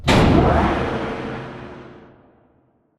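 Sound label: Assembly Conveyor Start Distant; Boomtown; Titanfall 2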